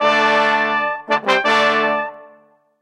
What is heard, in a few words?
Fanfare; Sam & Max The Devil's Playhouse gamerip; Sammun-Mak Fanfare (Eight)